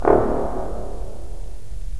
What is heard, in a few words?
BRS, Roland LA Composer